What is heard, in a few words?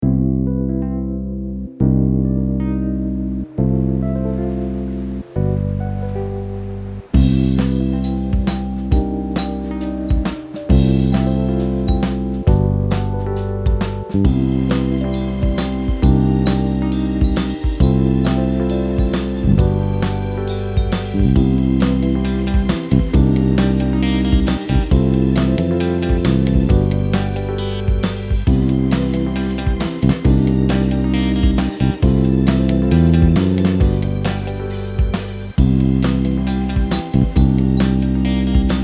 Sunny Interlude with Drums